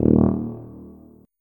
tuba note

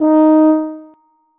tuba note

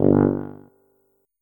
tuba note

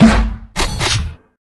grenade fire, weapons

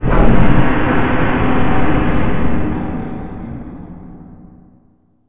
powerup shield

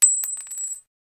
brass, weapons

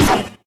hookbomb fire, weapons

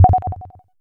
spawn

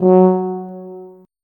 tuba note

